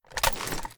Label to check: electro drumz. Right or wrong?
Wrong